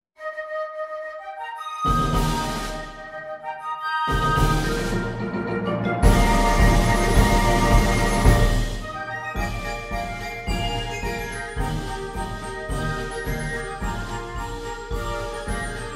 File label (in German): marsch